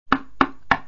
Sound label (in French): battement toc toc